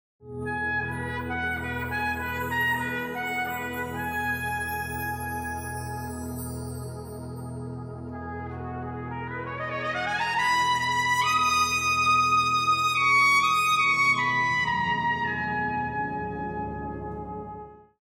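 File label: soprano cad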